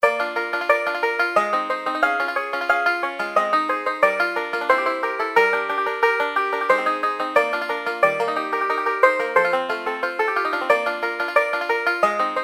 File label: hoedown to joy, chiptunes